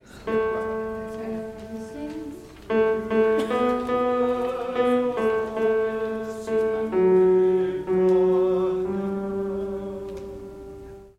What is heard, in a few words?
Magnificat bass